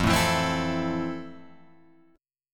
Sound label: F Major 7th, Guitar, Open F; strummed; JGuitar